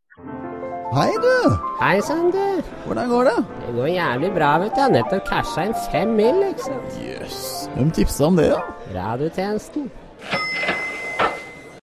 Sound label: jingle; Radiotjenesten vestkanten